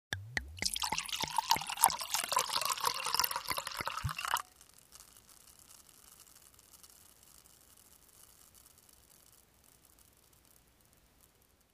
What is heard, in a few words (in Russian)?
nalivayut vino v bokal bulkane